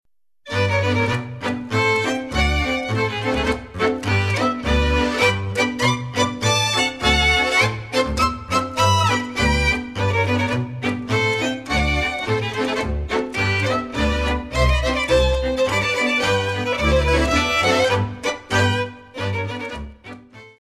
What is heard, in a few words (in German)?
Schottischer; aus Neustift